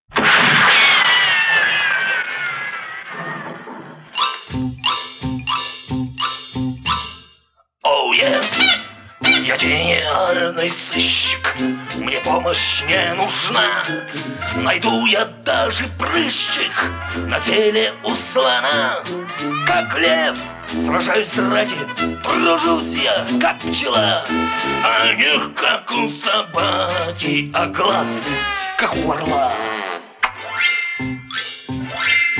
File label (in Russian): Песня; Бременские музыканты